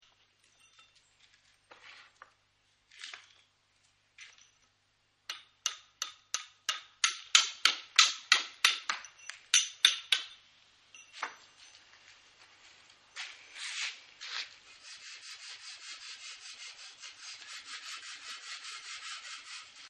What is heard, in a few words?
Sculpting, scrape; Scrapes; Objects; Partners In Rhyme